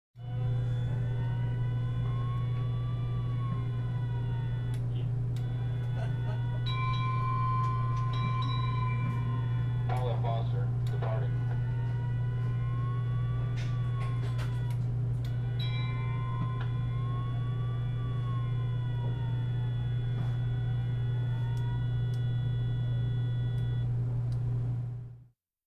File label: Inside machine room; KMP Film Video Sound Effects